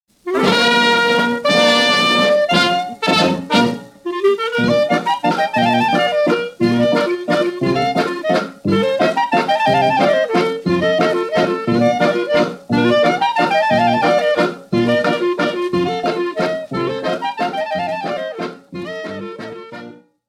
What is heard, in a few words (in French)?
Landler Steirischer, Allemagne